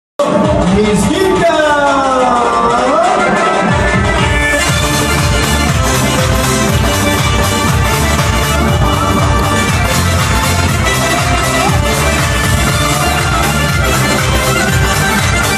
самый быстрый танец лезгинка на грузинской свадьбе

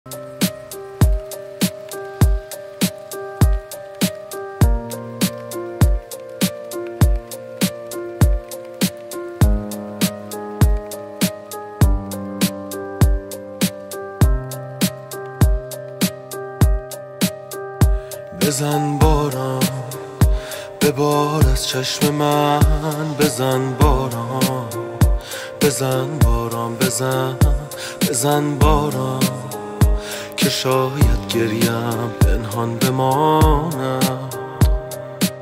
иранская песня

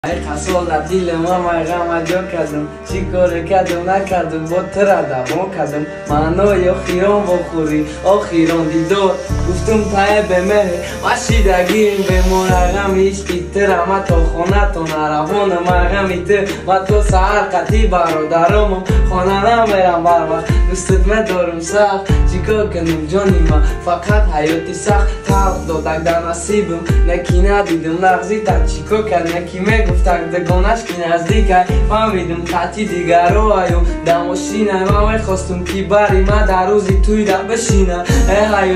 живой голос фристайл

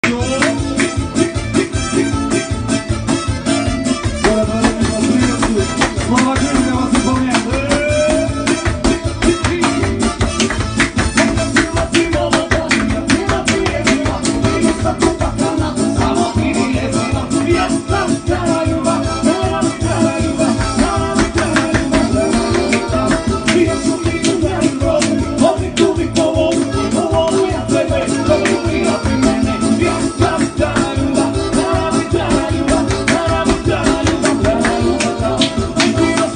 цыганская полька цыганская песня; цыганка танцует; цыганская свадьба

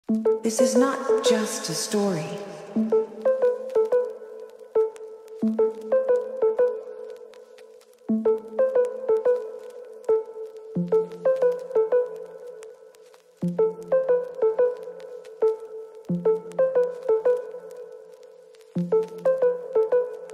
усиление басов

